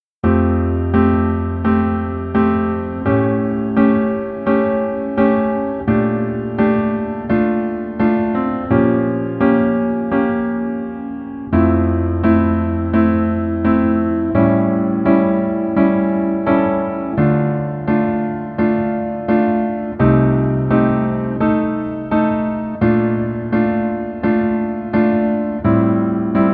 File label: Piano ver